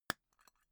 tobacco tin open pop

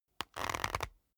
leather creak short soft

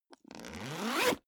zipper backpack open accelerate

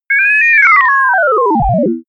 telegraphic synth

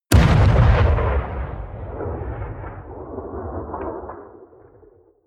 artillery light distant

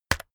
keyboard external key hit very fast

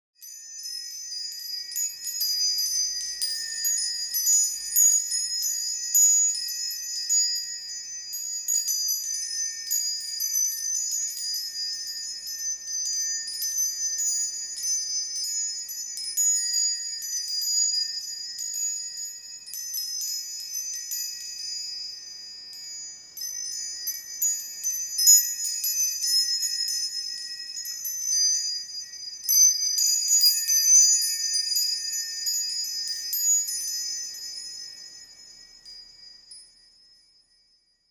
mystic high bells

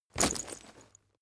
leather metal impact chink

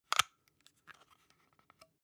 plastic hook adjust

